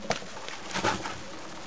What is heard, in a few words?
rope collect; items; aomdc